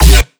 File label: Kick